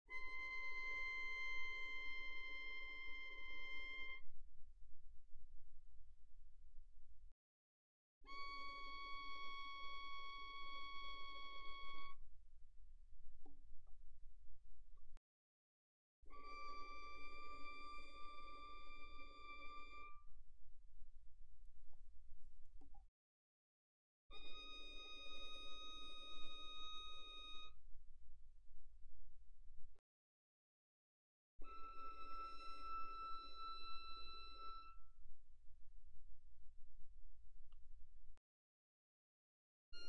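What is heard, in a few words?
Violin arco pianissimo sul A C6 B6; Strings; violin2012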